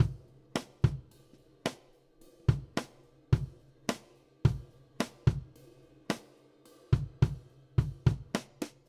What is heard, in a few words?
Country Drums (Intro Alt1); Digidesign; Pro Tools Essential Loops